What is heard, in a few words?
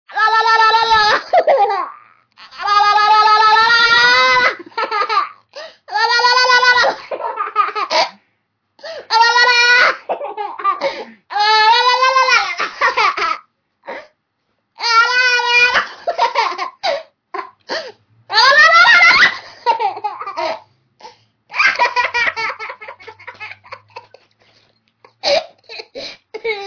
Happy baby playing